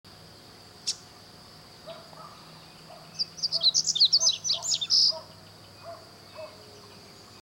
corbatita común, Sporophila caerulescens, Double-collared seedeater; cantos

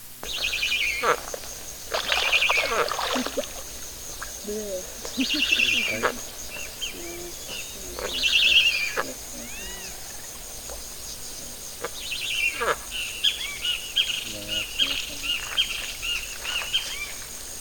jabiru; cantos

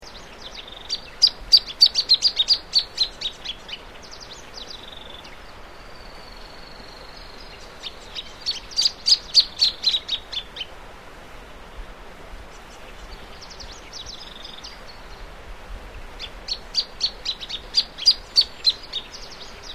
espipe choman; cantos

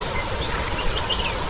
diucon

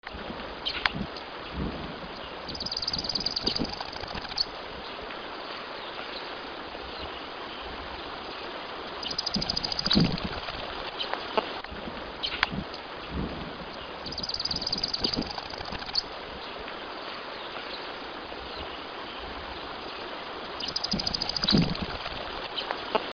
pitiayumí, cantos, aves

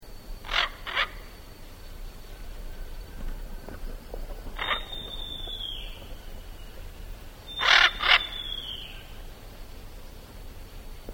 guacaverde, Guacamayo Verde, Ara militaris, Military Macaw; cantos

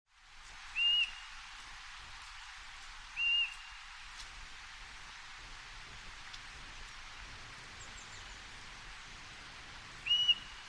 Birro Grande, Myiotheretes striaticollis, Streak-throated Bush-Tyrant; cantos

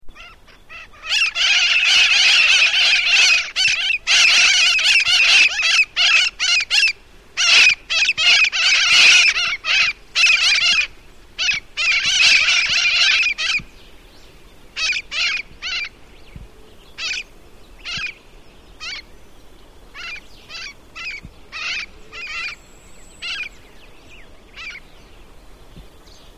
calacararoja, Calancate Cara Roja, Aratinga mitrata, Mitred Parakeet; cantos

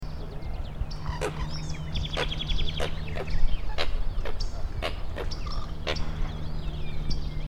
gall andina; cantos

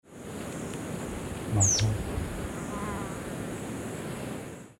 Picaflor de Barbijo, Heliomaster furcifer, Blue-tufted Starthroat; cantos; TROCHILIFORMES; Trochilidae

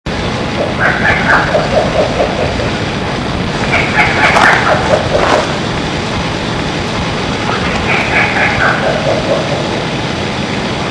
Lechuza Bataraz, Strix rufipes, Rufous-legged Owl; cantos

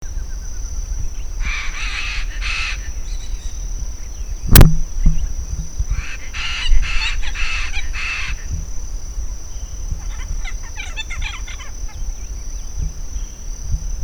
guacacastana, Guacamayo frente castaña, Ara severus, Chestnut fronted Macaw; cantos

